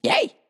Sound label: yey; goldentavern